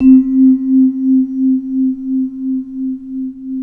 MLT VIBE

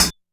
hihat, Trancefunk 134 F#min; Big Fish Audio; Hardcore Breakbeat Action; Trancefunk Mutator

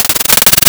UHF Simple Noise, wavetables; Architecture 32

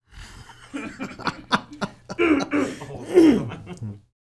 LAUGHTER, Jazz; Spectrasonics; Vocal Planet; Jazz FX

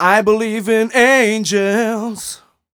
m 127 mel a, Vocals

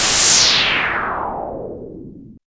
hit, IMPACTS & HITS; Techno Trance Essentials

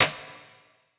Side Stick, percussion reverb; MARKYDRUMS

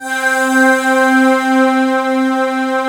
FINAL PAD; ENDINGPAD

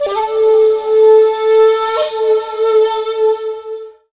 ethno, ethnic; Techno Trance Essentials

